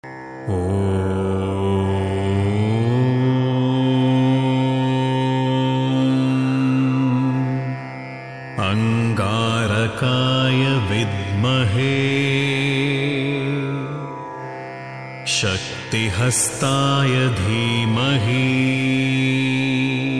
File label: angaraka gayatri